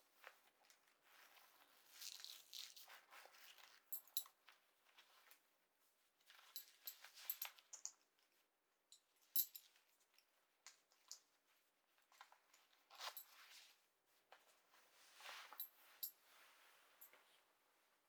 ジーンズを脱ぐ

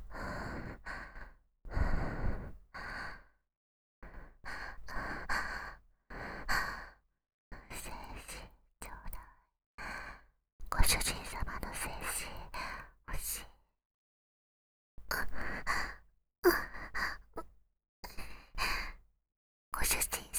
喘ぎ; 精子ちょうだい♪; 深雪

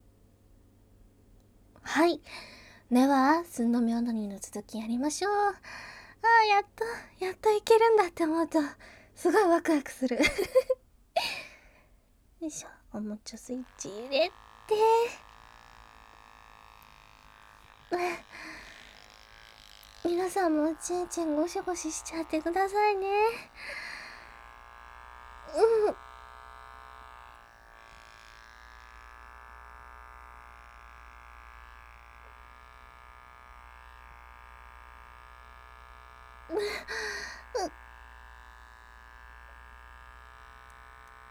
オナニー カウント ステレオ; 凛ちゃんのオナニー

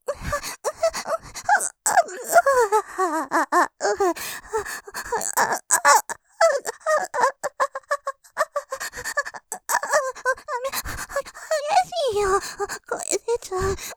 喘ぎ さらに 激しく 感じて、とろとろに 喘ぎ マシマシ, モノラル; おかずにスパイス２; 勢いマシマシ

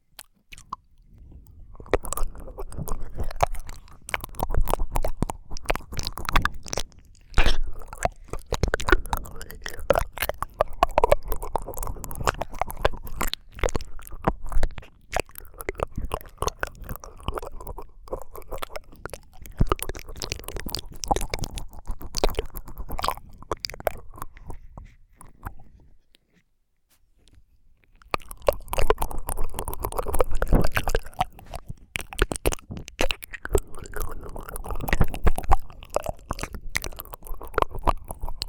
両耳舐め, ASMR

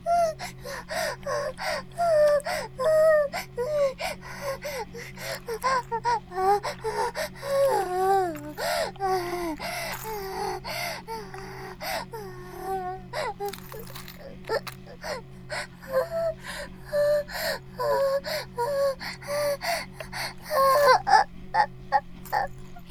バイブ放置; イクたびにわけて

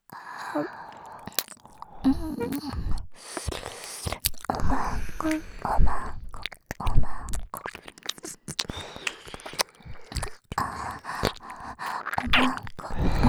耳舐め; お屋敷メイドのハーレム淫語ヘヴン 気が済むまで耳舐め淫語を堪能できるバイノーラルオナサポ音声

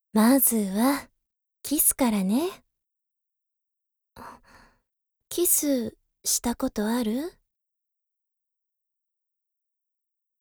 フェラ

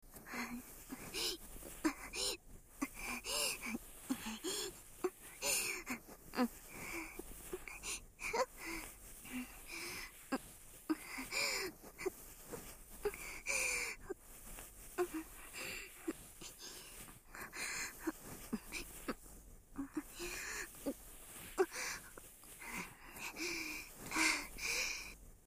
ループ梵天; 通常右版

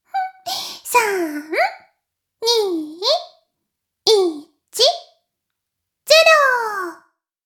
妹 しこしこ音声; 妹めしこしこ音声

